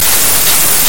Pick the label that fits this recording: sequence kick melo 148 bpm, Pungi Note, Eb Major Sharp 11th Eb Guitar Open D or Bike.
Bike